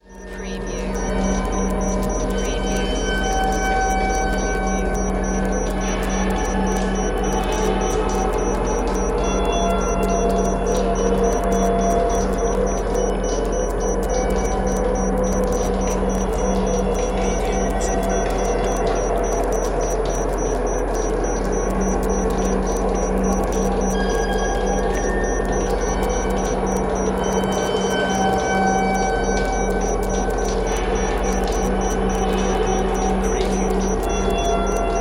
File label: Spaceship Abandoned